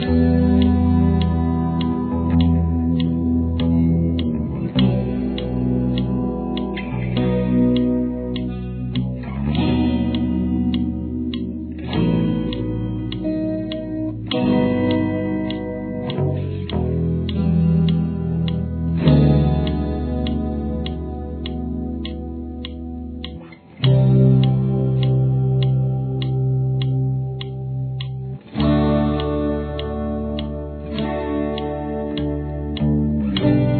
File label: backing